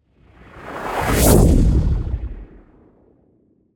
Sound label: Deviant Whoosh Flabby Slow; Rescopic Sound; Distinct Whooshes